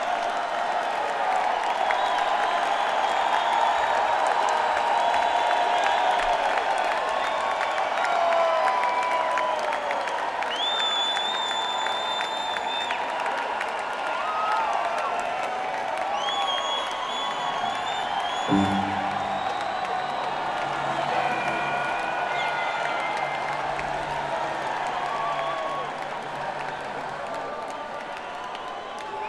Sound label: Crowd, Alpine Valley Music Theatre; East Troy, WI